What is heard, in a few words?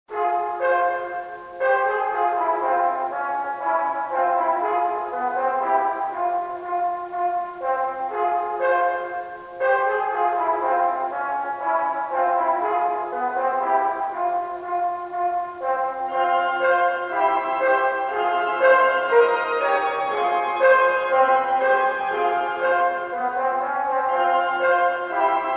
horror remix